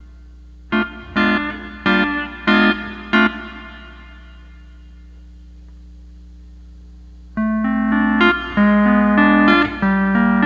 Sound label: 12 String Lap